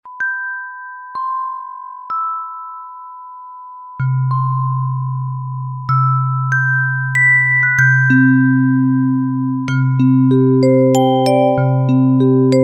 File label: MUSICBOX